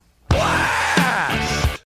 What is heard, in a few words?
blast